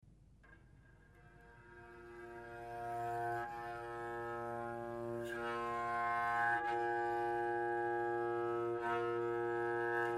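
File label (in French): archet classique, harpe; touchers de la harpe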